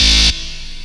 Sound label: saw lead synth